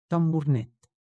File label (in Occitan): tambornet